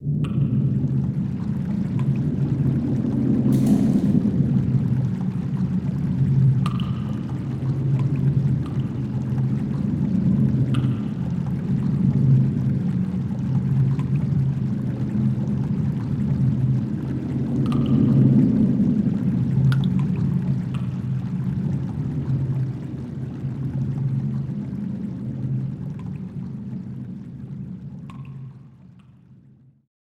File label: Cave Ambience; Chrono Cross